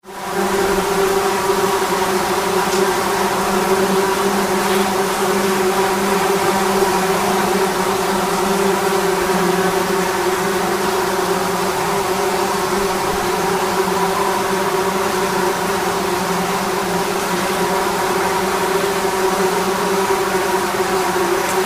bees nest under a railway bridge, Suffolk UK

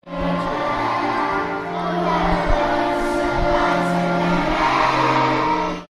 kite

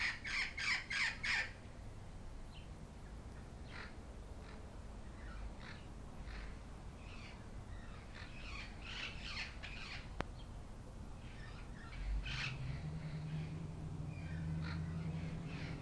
wild parrots outside at the grill

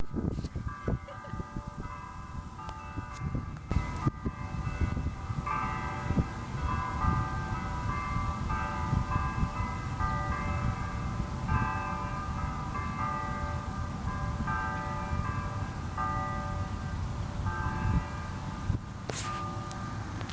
six o clock bells

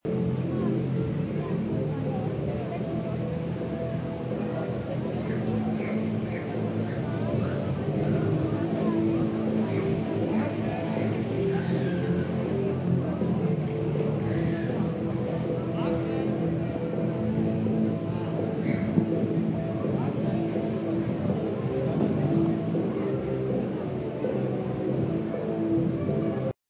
atmosphere in front of; mobel seegmuller

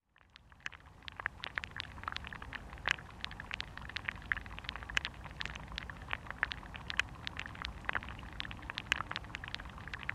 hogganfield pond hydrophone recording

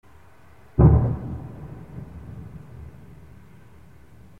damascus night sound

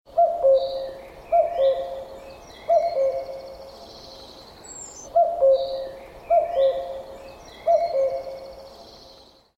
ringtone cuckoo; credit jack berteau

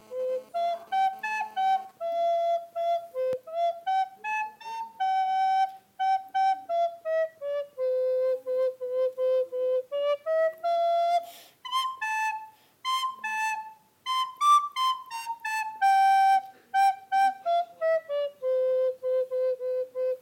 Finja playes the flute

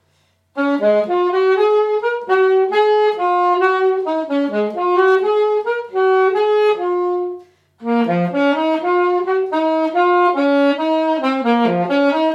Bb major and F Pentatonic

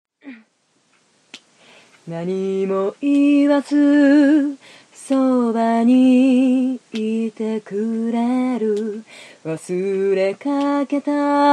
humming bird

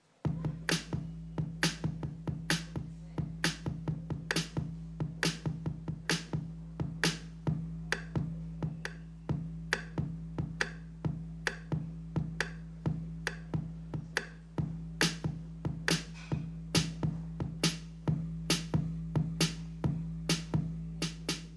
bad as bass

